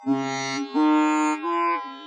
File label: sitar synt